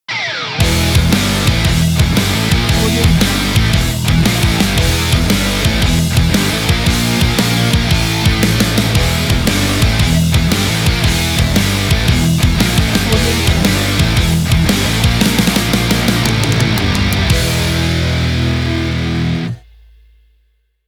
shuffle rock logo ident

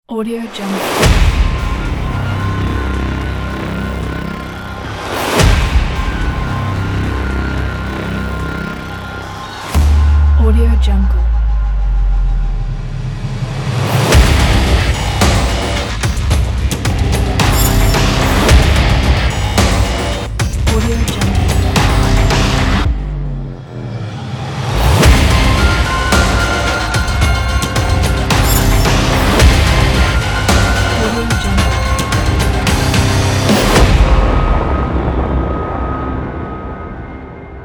post apocalyptic industrial trailer

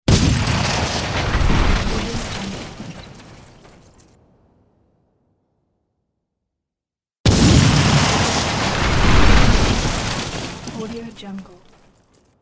explosion

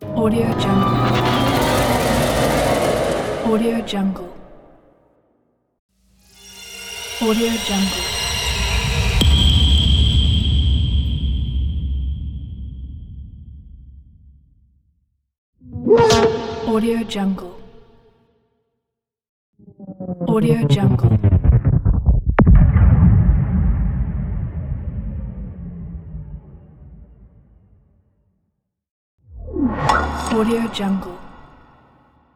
loud cinematic transition pack

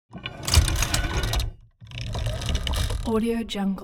object mechanical mechanics medium trail

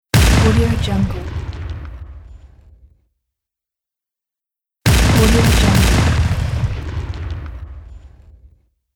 explosion single multiple

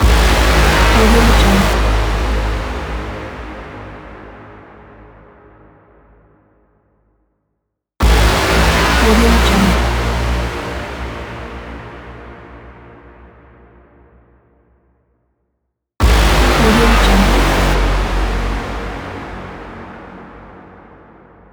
cinematic trailer mega horn

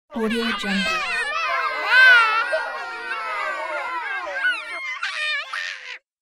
family group funny screaming

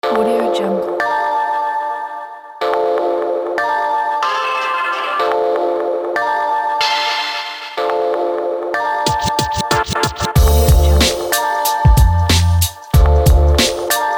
easy hip hop; choclatefix

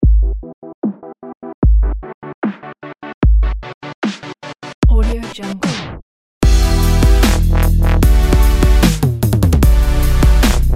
dubstep technology

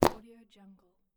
fart

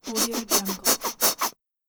empty shampoo bottle squeeze

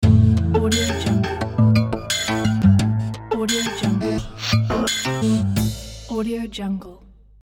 logo technology medicine

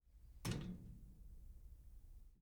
metal screen security door

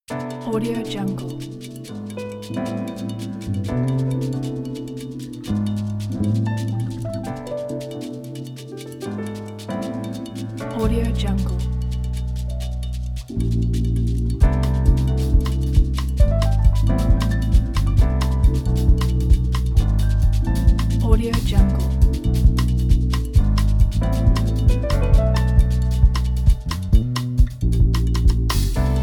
the lounge

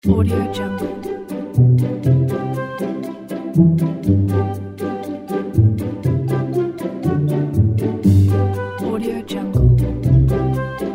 fairy summer traveling

